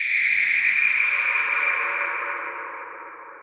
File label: Scream; Phasmophobia